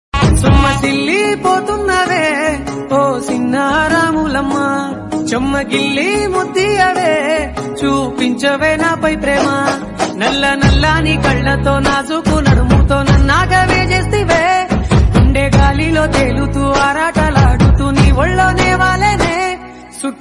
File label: Tamil Ringtone